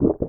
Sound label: eat low; English; Monster girl quest Part1,2,3; Visual Novels; Cecil; Alra